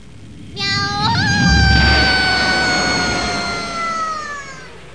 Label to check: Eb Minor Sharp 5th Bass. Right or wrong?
Wrong